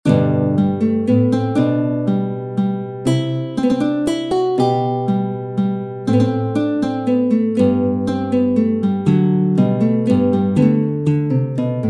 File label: Menuet in G major, Classical guitar; Christian Petzold; BWV Anhang 114